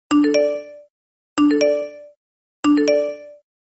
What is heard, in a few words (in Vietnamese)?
Thong Bao IPhone